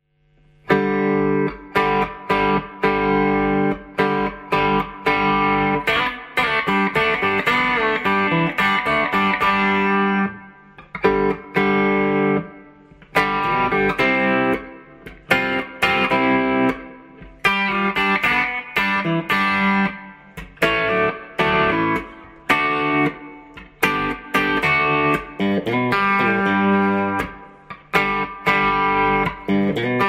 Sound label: Guitar